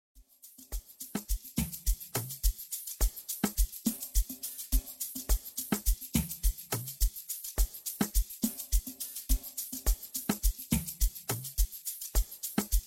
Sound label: vocal Mix